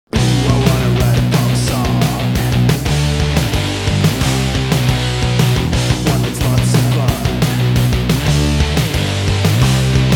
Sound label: pop song; syntax24